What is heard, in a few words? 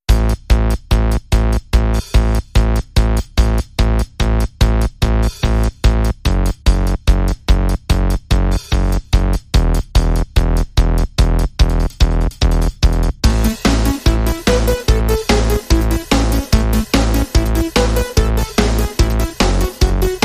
Feel Good Anthem, No Guitar